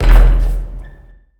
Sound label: Menu Basketball